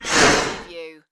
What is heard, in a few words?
Alien Breath Sniff; sound effects bible